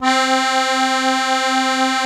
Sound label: ZUPAN CHRO, AKKORDEON